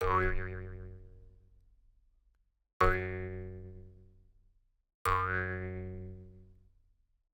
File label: Goofy Spring Bounces; Coll Anderson; Slapstick Comedy; GDC Game Audio Bundle